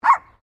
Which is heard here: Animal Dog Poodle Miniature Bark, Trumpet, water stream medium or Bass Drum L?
Animal Dog Poodle Miniature Bark